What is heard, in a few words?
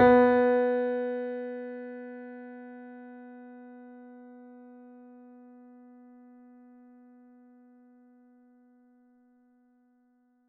mini grand; bananenkeyboard